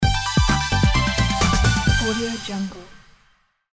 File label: complete quest; sunsmilestudio